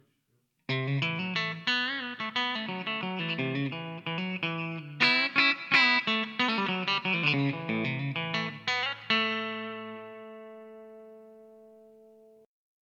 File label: Telecaster Country Lick Bridge; Blue Note Gitarren Amps; Berlin; Harald König